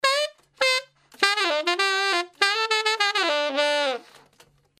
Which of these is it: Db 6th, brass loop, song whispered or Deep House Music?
brass loop